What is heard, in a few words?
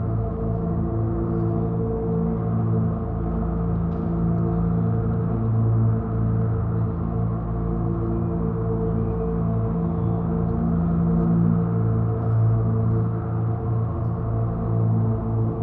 ship habitat idle; ships; Stellaris; shroud